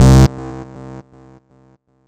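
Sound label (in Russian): эйсид хаус одиночный вибрирующий резкий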